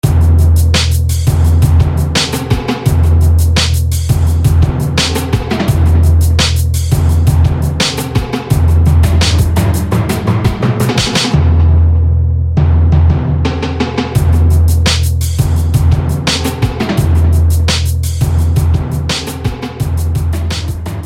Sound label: Thunder Drum